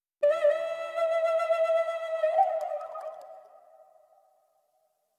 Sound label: 124 BPM A Min Flute; Cymatics; Destiny Gold Expansion; Dior